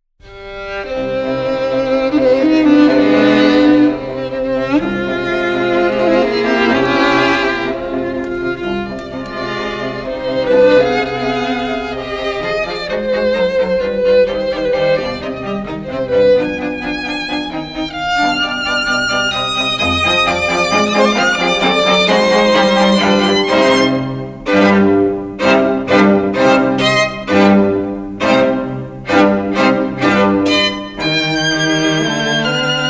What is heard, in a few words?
strings; beethoven; mastered